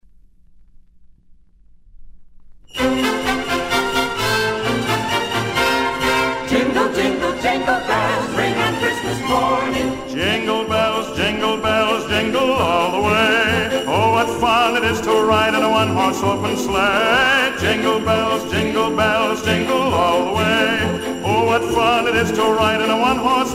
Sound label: Jingle Bells; Goodyear Great Songs of Christmas Volume 2